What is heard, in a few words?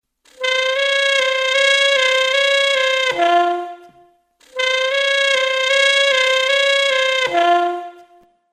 flatter, sax